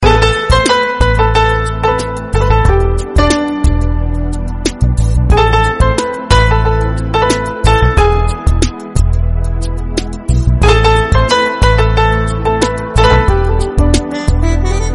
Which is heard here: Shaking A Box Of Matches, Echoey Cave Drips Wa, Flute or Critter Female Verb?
Flute